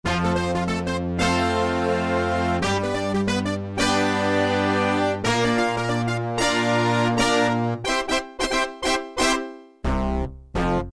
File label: BIG BLAST, Casio VZ-10M; Internal factory combi